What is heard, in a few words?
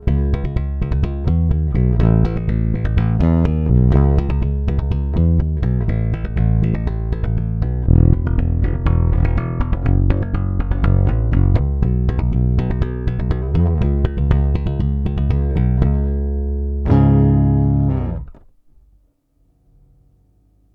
Neck metal finger